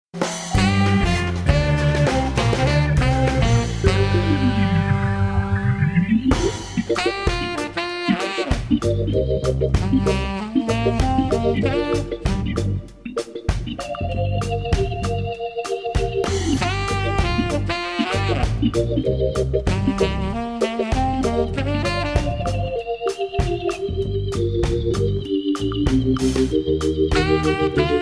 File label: Jazz Quartett